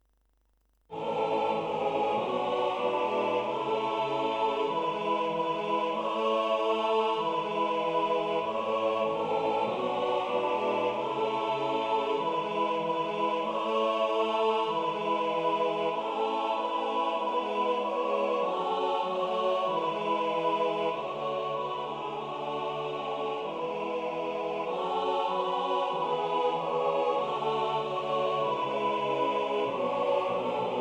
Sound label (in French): la belle rose tutti, Chants